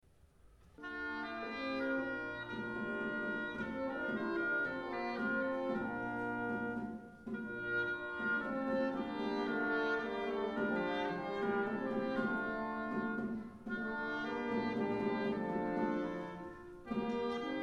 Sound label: Courtly air and Dance Basse Dance